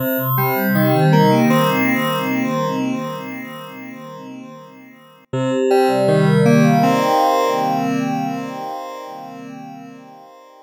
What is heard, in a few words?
Wavetables